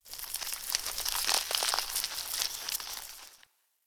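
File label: Ice Crunch Random Ambience; Warframe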